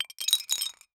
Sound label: objects